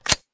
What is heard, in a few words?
famas bolt forward; weapons; cstrike; csgo